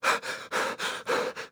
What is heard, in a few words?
Breathless; Finding Nemo; XBOX